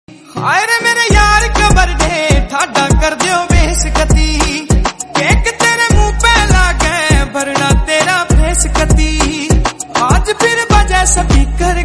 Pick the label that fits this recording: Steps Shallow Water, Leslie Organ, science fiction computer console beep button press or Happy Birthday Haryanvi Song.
Happy Birthday Haryanvi Song